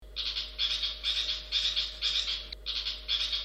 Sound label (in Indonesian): varian lagu burung cendet dari suara burung kutilang